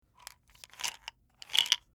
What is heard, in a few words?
Pill Bottle, Pour Out Pills; Pour Out Pills From Plastic Prescription Bottle; Bathroom; Household